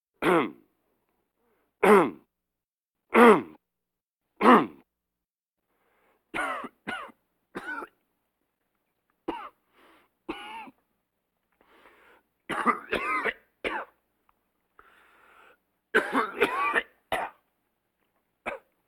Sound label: Vocal effects breathing Moaning Baby